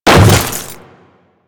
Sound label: Hammer Boss Step Ice, footsteps